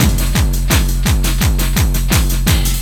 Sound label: LOOP, Eurotech; Sounds Good